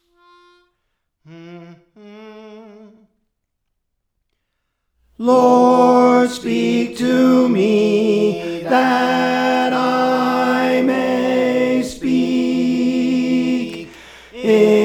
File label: Tenor, Songs; Praise For The Lord Training; Lord Speak to Me